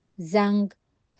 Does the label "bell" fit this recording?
Yes